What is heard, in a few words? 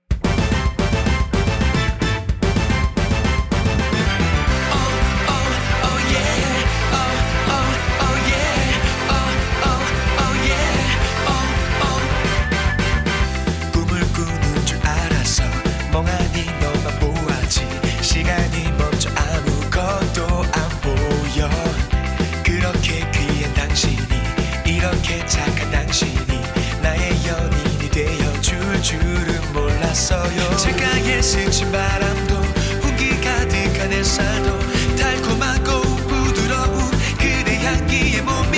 Love Beat To You